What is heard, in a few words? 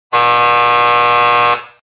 buzzer; XNote Stopwatch; Cronometro